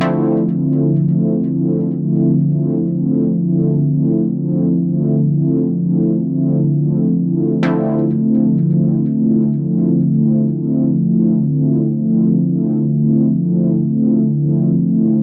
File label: 126 Bpm Endless Detroit Chord